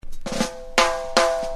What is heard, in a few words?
ROLL